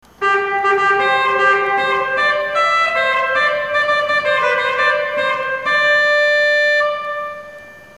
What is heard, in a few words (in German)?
Trompete, Orgeln; kirchenmusik kaarst; orgeln und glocken